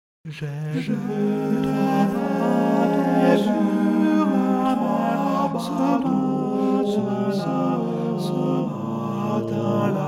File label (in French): noel tenor